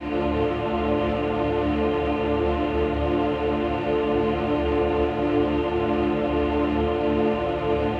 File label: Ab, pad; M8